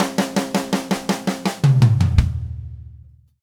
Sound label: Red Maple Groove 110, DW Red Maple Kit